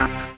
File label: CHORDS; EastWest; Dance Mega Hip Hop Electro